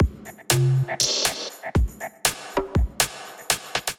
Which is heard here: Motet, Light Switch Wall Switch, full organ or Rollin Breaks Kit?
Rollin Breaks Kit